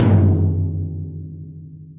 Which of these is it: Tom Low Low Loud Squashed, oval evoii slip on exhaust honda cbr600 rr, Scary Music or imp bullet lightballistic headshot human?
Tom Low Low Loud Squashed